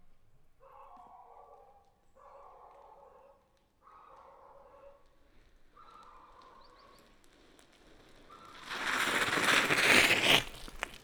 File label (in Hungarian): oda vissza kontrazva, kerekpar; varboc